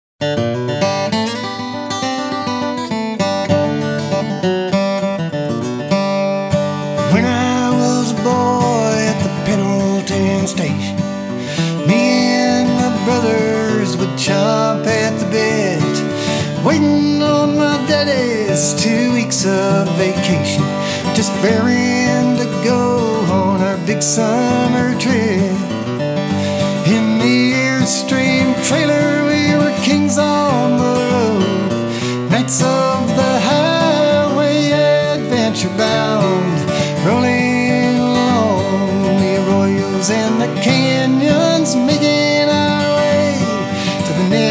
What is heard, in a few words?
Airstream guitar voice mix; Gas Records